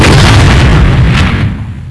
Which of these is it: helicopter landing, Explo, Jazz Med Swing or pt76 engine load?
Explo